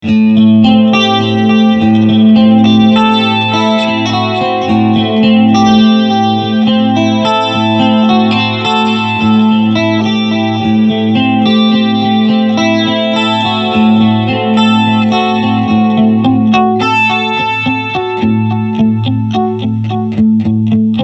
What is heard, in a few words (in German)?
Clean 1 mit Delay